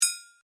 Bell Brass Japanese Kane Metal Striker, Traditional Japanese bells; Bells (Sound Effects:Musical)